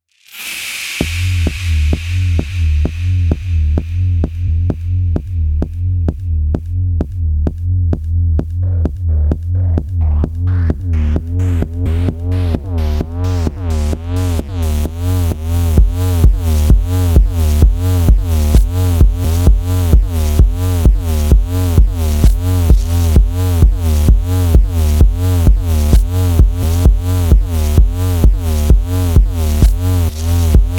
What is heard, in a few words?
Elektronika Techno Song; SampleTank 3 CS